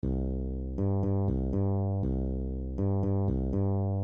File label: Bossa Nova Groove Variations